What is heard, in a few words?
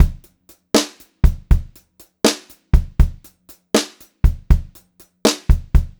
BEAT, Brushed Drum Loops